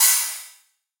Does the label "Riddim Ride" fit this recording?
Yes